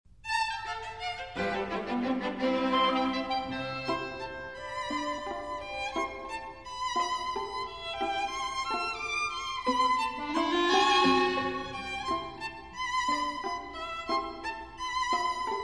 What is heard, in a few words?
music box waltz tempo; Museum Pieces – The “Pierrot” Music Box